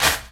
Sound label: original clap D key; single hits one shots